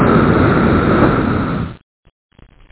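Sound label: spread gun; doom; quake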